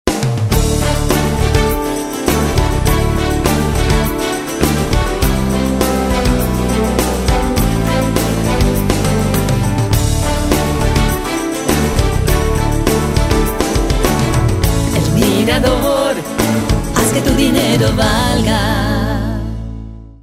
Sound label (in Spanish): Jingle; El Mirador; Blanchet